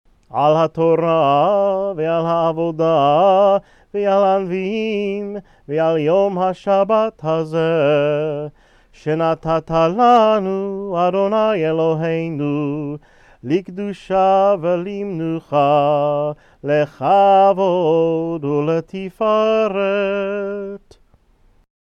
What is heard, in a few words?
Blessing after Haftarah; Al HaTorah